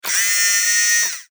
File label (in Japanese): ロボットアーム